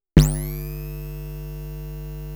Electric, Switch ON OFF, power, turn-on, medium frequency, motor, pulse, hum; Sound Sower; Electric Field